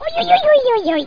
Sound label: die; gubble